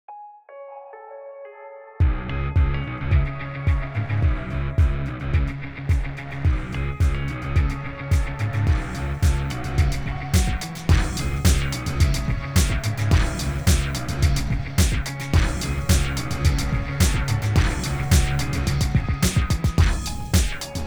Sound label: industrial EDM; Jamie Coleman, Film Composer